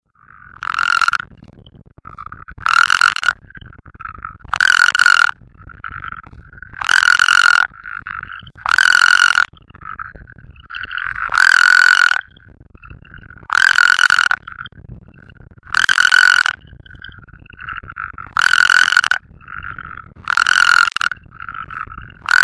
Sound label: frog, Call a short "snore"; Spea bombifrons